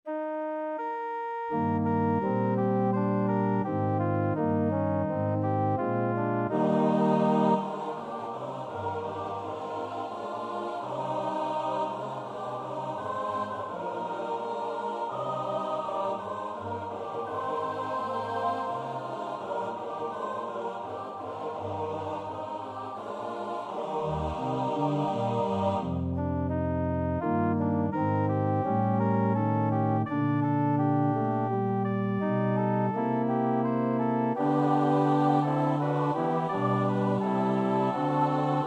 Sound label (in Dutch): S-A-T-B, koorpartij oefening; Sowerby (Leo)